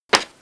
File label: Punch